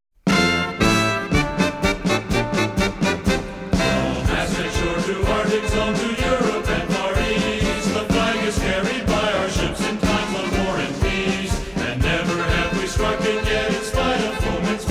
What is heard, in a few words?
Semper Paratus Vocal; Coast Guard